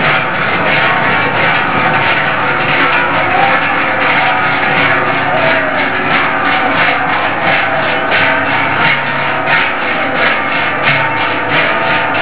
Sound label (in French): cloche